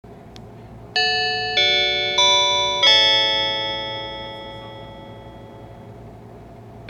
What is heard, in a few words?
183 chime; higashinihon